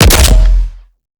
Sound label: stickybomb mod fire